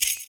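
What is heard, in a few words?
PERC, percussions; Univers Sons - Raricussions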